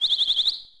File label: Bird